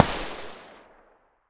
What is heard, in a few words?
muzzle p99 mono far, weapons